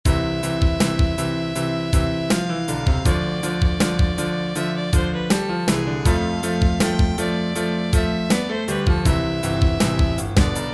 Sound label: drums